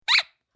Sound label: Funny Rubber Squeak; Cartoon / Comedy / Silly little rubber squeak; Miscellaneous Cartoon Sound Effects